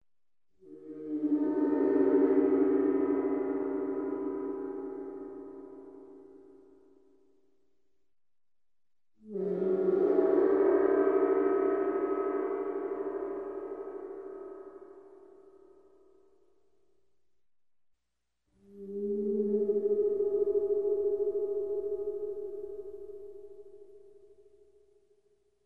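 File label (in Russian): маленький гонг космический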